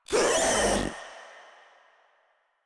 Proto Infested Runner Pain; Warframe

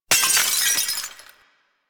Glass Shattering Enemy Shatter; DE; Warframe

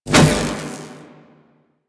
Whoosh; Warframe; Quests; The New War; Drifter

